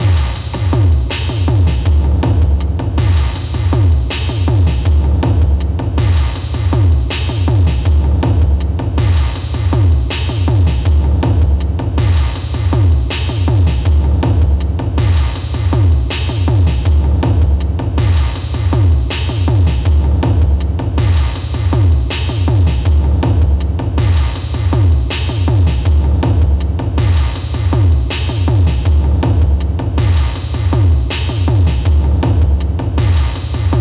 BIAS Pedal Delay Drums2 Space Tape

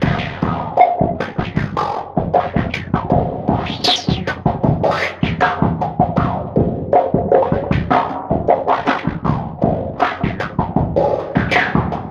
Moogerfooger Plug-Ins Drums

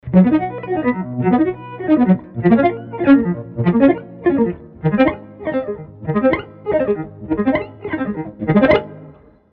ebow Arpeggio

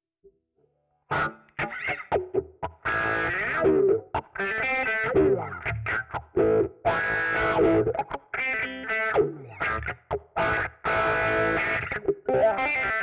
Zoom Wah Wah

